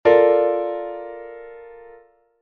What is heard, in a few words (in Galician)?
fa-la-sib-re